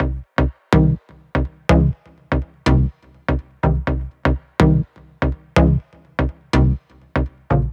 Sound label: 124 Cm, Melodic Loops; M8; Electronisounds